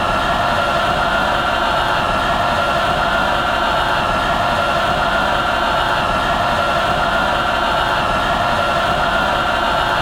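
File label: Basketball Crowd Jeer Loop; Crowds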